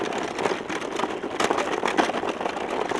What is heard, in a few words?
Chariot Loop; Civ4 warlords